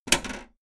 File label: video tape recorder flap clatter